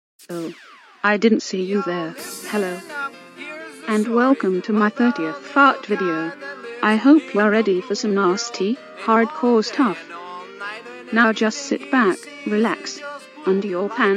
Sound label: fart